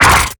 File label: Exertion Light; Evolve; Gorgon